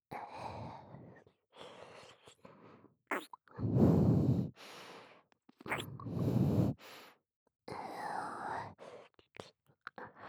右耳舐め, ASMR; アドリブ集

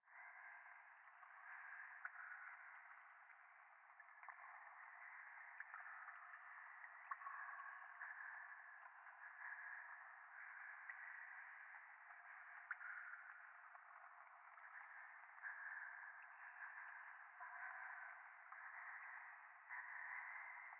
右耳快感吐息 雨上がり, ASMR

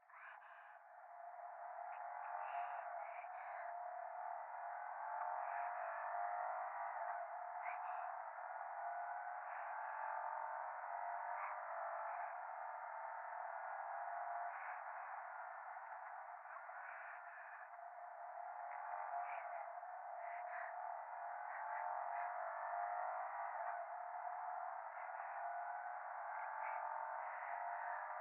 左耳 密着 処女 喘ぎ 車の中

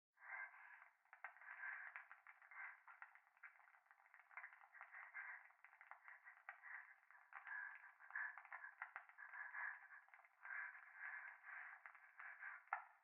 風呂 右耳 快感 吐息 暖炉

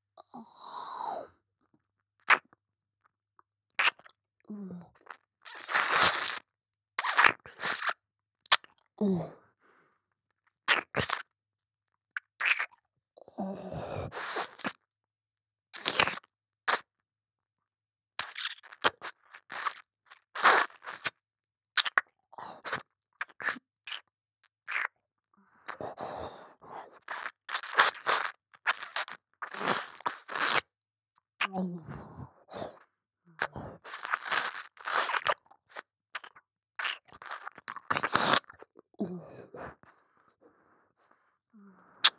耳舐め; ヘレナ

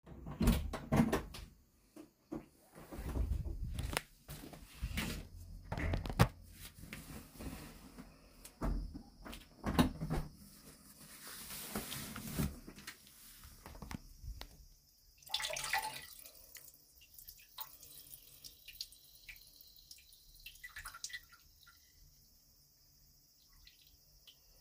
おトイレで、おしっこしながら、虫の声

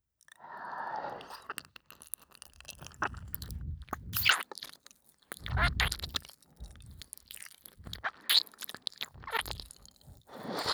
耳舐め 右; 夜のひとりごと; 耳舐めオンリートラック

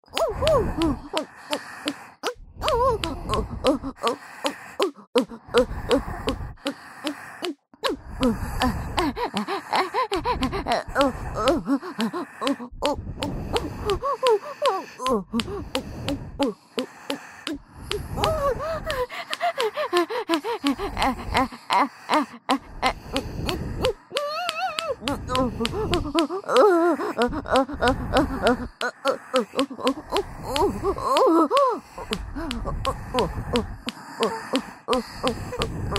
オホ喘ぎ ＋耳元発情吐息; おまけループ（オナサポ）; 3WAYループ; SE有

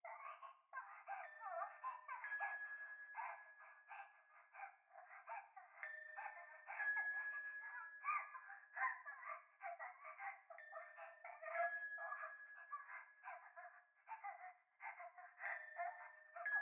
風呂 可愛い喘ぎ 神社

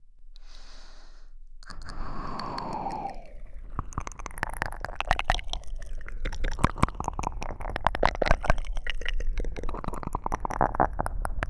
ゆっくり分身コース

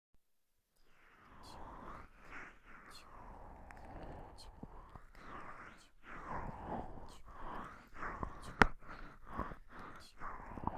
オイルマッサージ

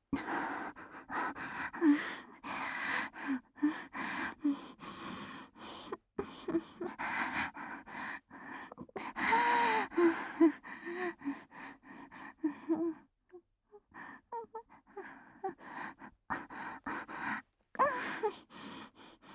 お布団ムレムレこそこそエッチ

